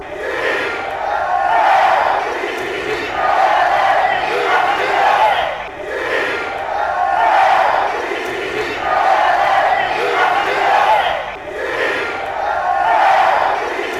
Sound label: c h i l e